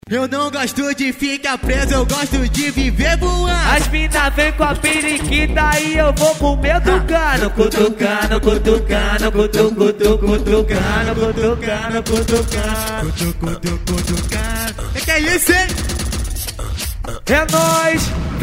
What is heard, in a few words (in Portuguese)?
aquecimento ao vivo